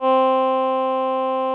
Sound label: VP-330, String Machines